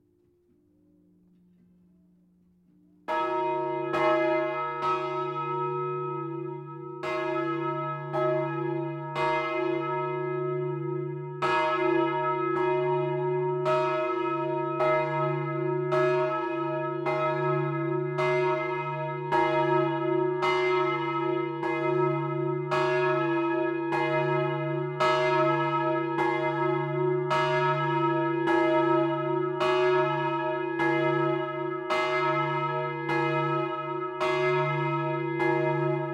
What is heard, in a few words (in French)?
Lausanne cloche